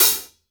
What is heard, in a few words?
hit, hi-hats; Sampleheads; New York City Drumworks VOL-1